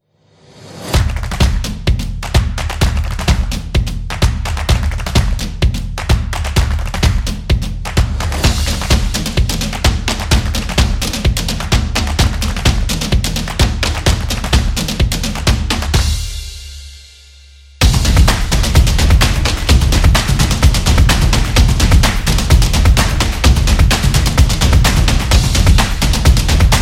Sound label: Drum Claps Stomp